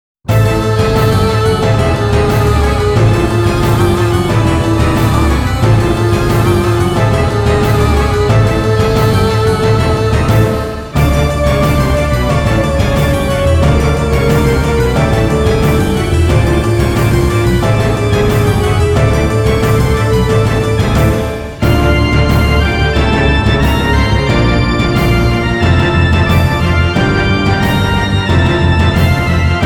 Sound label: Tension And Anger; record of agarest war; イベント 緊迫、怒り